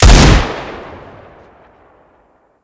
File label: deagle, gunsound; cstrike; cs16; weapons